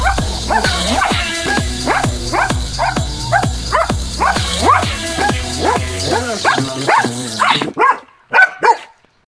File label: Dogs barking in sync with the music; What is surprising about the relationship between the barking and the music?